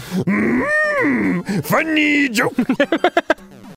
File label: laughing; yoda